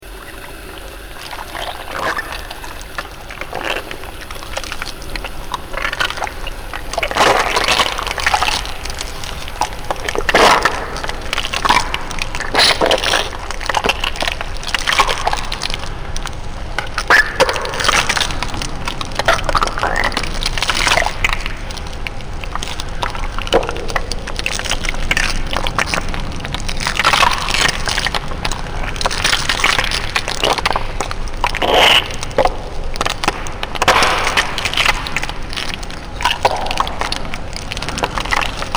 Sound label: schall trichter klanginstallation sounds of clay; klaus osterwald